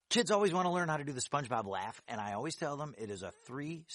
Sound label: laugh long baah; spongebob